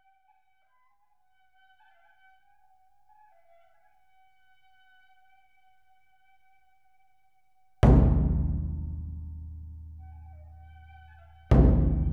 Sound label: O-Daiko Big Drum; Bass Power